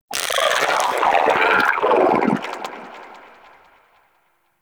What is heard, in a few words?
Vocal Angry, Shrimp Lantern, Creatures; Baliq